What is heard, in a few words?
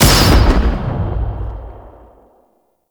Impale Proj Explosion; Warframe; Warframes; Hoplite